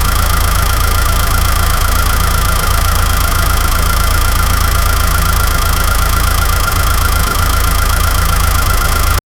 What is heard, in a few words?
v6 engine sound idling at, 800 rpm